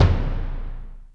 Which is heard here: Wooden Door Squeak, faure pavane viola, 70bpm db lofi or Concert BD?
Concert BD